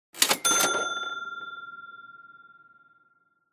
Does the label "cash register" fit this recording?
Yes